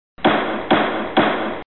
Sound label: gavel; SIMUTRANS